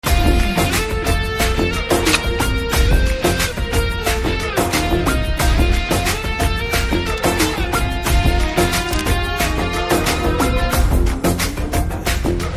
Trailer BGM Ringtone; Dabangg 3

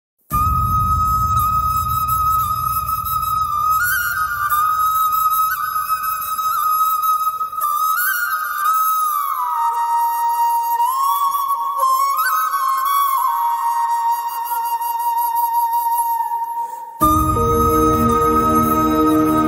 Female Version Flute BGM Ringtone; Telugu; Vakeel Saab

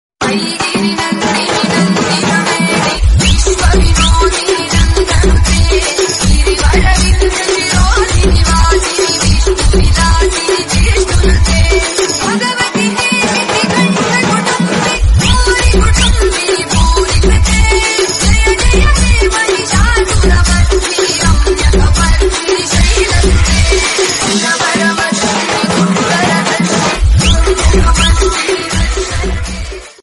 Durgamma Hyderabad Chattal band Ringtone Telugu; Devotional

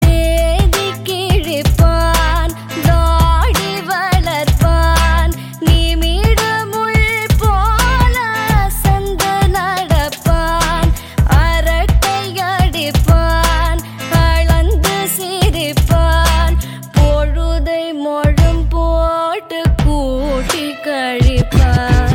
BGM Ringtone; Tamil

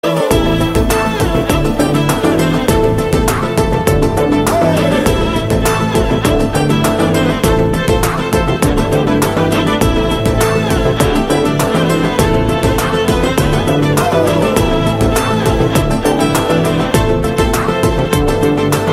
Theme BGM; Vakeel Saab; Telugu

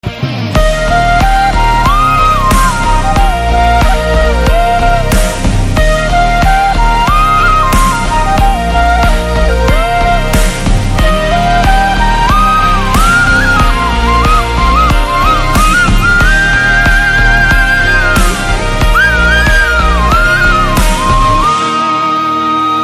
BGM; Manmarziyaan

